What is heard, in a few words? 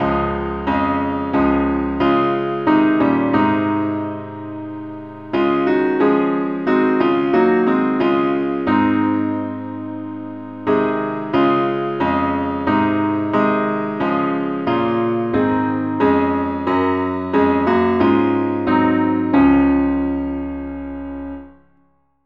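parts only, key D flat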